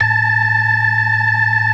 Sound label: BLOOD OCT, Synth and Organ